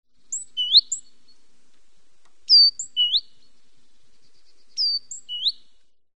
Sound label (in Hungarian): szencinege